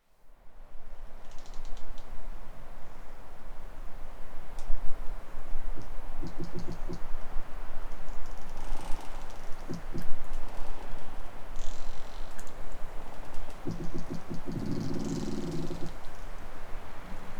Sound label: tree creaking